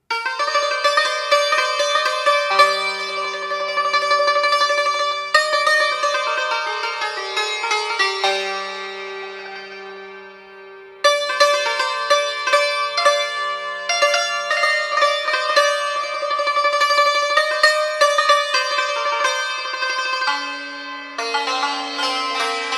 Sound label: Shahtoor